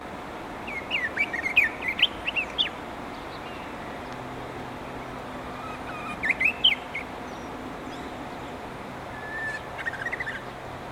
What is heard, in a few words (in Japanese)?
uguisu